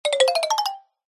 cartoon footsteps fast scurry scarper mallets

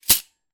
household cutlery handful in hand; zapsplat

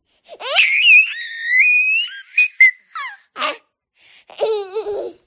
vox 12 month baby long scream laugh giggle

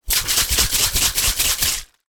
household polish furniture bottle shake; sound effects one

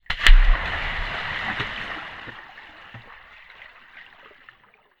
nature water splash slap underwater recording

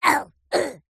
cartoon voice high pitched pain grunt

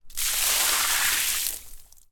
water pour from plastic bucket onto ground

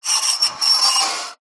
foley metal sharp scrape

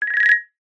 cartoon blink flirt marimba

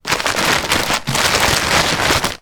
wrapping paper movement; foley; zapsplat

